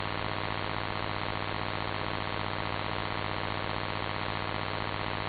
QRM

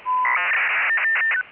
bpsk ack

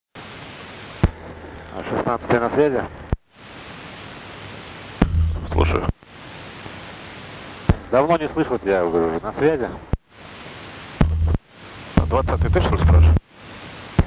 1261 rus italian

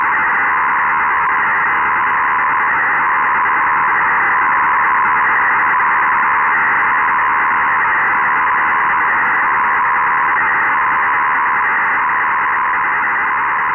17130 khz usb fsk-2 shift 850 hz br 1200 hz

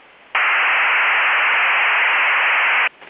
ale-3g bw2 signal

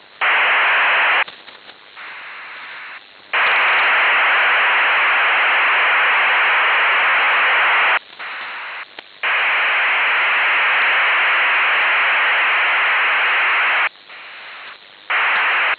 zap

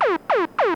kospas-sarsat 1215

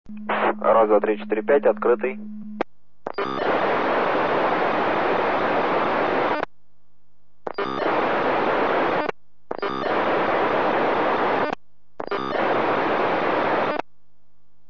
aegis clear encrypte

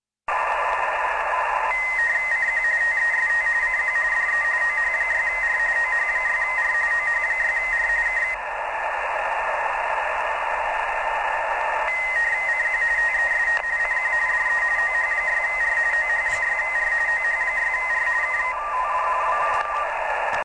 RTTY

